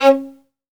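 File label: solo violin, strings; ILIO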